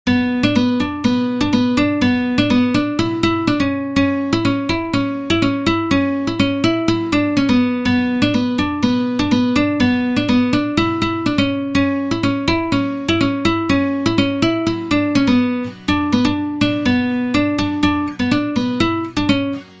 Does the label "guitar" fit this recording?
Yes